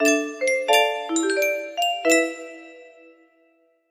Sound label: melody